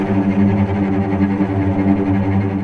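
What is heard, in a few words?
cellos tremolo G2 piano left, string; East West - The Ultimate String Collection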